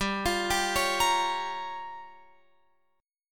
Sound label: G Minor 7th Flat 5th, Guitar Open F; Gm7b5; arpeggiated; x,14,17,14,16,17